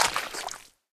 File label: marsh step; Golden Crayon Sounds